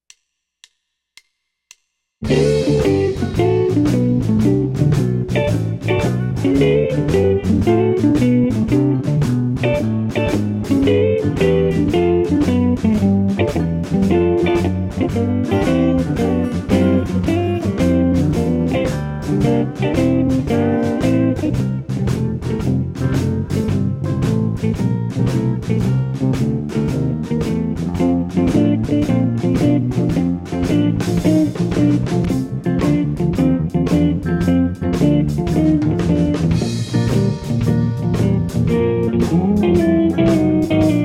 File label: Major blues 4 bars 112, guitar